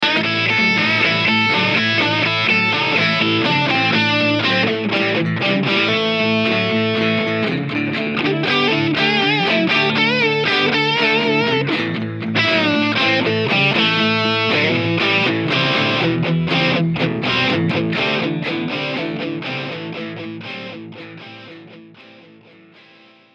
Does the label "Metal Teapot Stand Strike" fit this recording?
No